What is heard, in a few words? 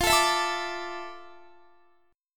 F Major 13th Guitar; strummed; JGuitar